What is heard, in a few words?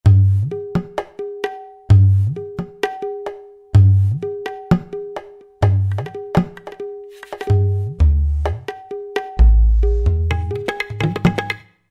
Tabla, Roland SR-JV80-14 World Collection Asia